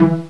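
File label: Pizzicato (E4); X-Wing; X-Wing Patches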